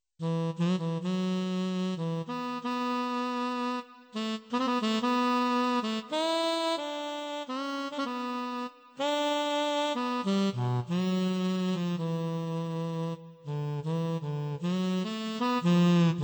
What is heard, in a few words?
tenor sax, Yamaha PSR-530